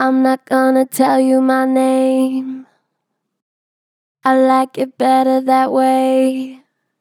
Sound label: Str Flat Im not gona tell you my name, Vocals, Pitch Flat Strong